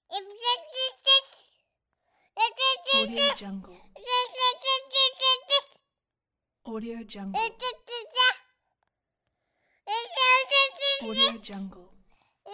baby toddler babbling interrogation

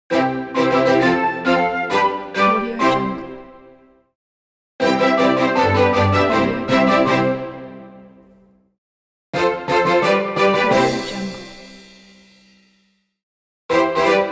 jingle victory sound pack

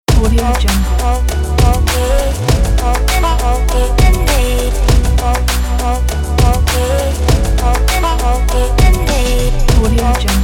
inspiring dance pop

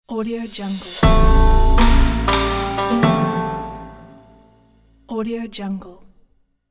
east logo

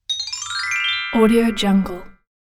magic chime

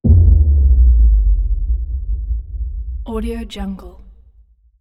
impact lf layer

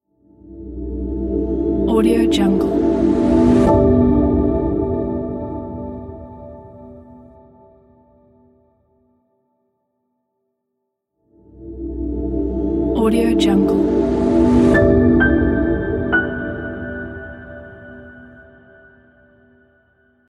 short logo reveal; wise-band

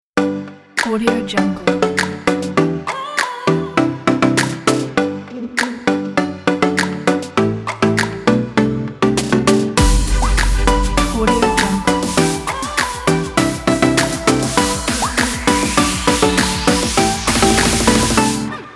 happy pop

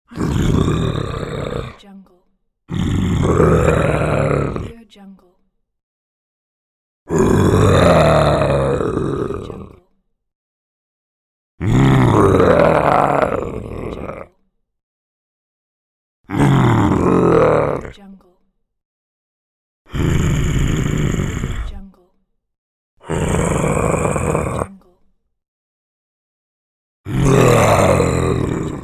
monster growling

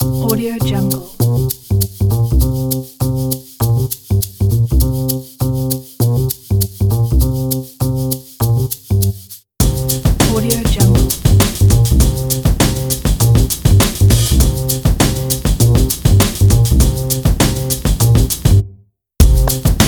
upright bass groove pack

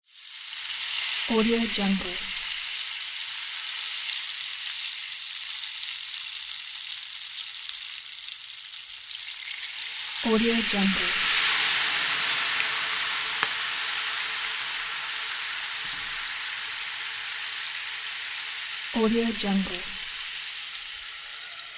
sizzling meat hot in a pan; jdproduction

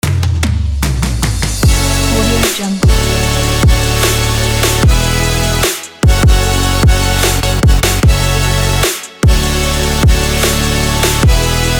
upbeat future bass

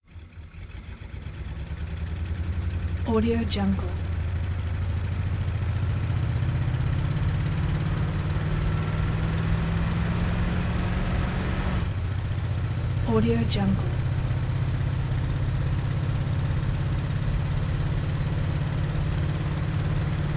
camper accel to constant driving decel; prosoundfx

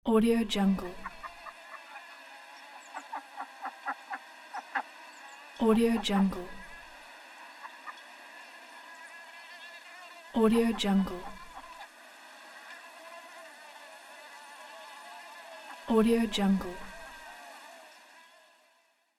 sound of chickens and roosters

